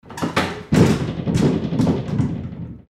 door old close

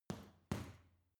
boxing punching bag side hook double soft

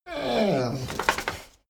dogo argentino whine shake; natur-tiere; hunde

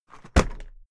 briefcase plastic drop heavy; industrie wirtschaft; bueroarbeit

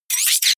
glitch short; zaps-buzzes

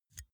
insert xlr extension quick; objekte; elektronik

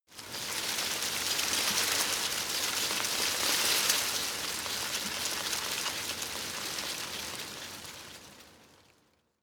scrub small branches rustle; haus-garten

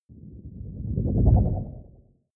fly by wobble ship short; swooshes-swishes

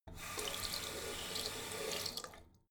tap flow light short, badezimmer

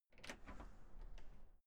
entrance door wide open outside

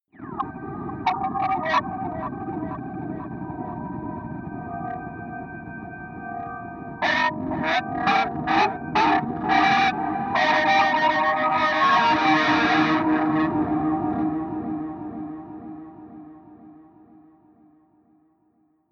atmospheric feedback distortion; musikelemente; drones

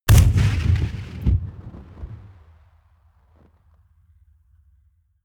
rifle ak-47 exterior fire single slo-mo; comedy-cartoon; Pistols and guns; pistolen-gewehre

